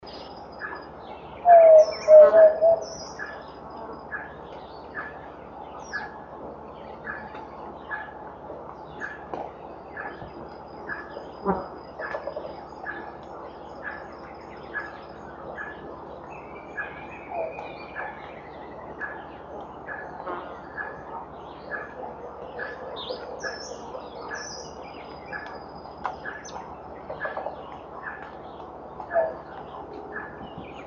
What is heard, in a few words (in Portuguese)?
canto do zabele